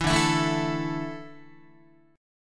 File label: D Suspended 2nd D, down, Guitar; 7,10,7,7,8,8; strummed